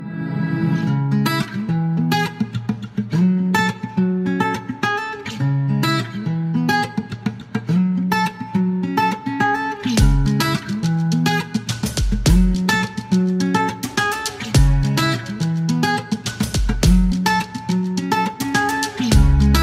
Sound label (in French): nouvelle sonnerie anglaise douce ringtone